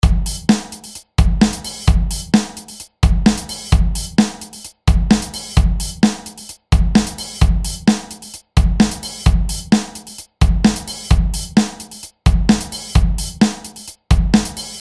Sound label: Drums Basement